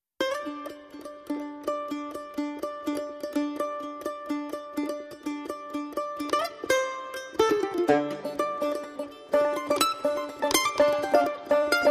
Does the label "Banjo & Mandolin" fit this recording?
Yes